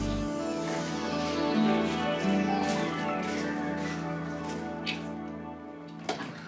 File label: Smooth Transition, Music